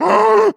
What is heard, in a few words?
warning, zombie vox; creatures